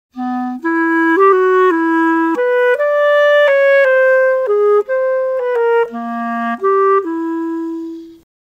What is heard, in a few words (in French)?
clarinette